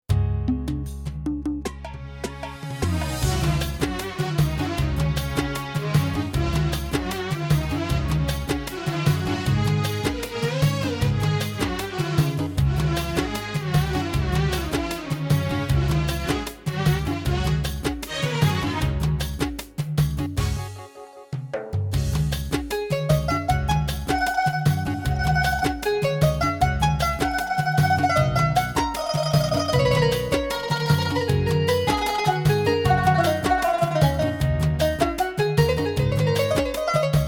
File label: Kamangat; Styles Demo